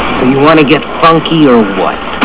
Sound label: funky